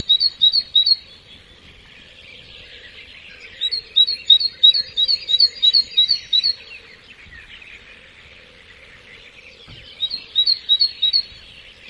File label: birds